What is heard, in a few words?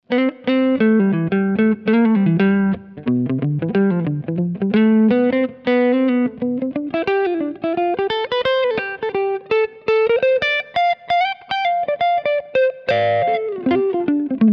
Neck PU clean sololines; George Benson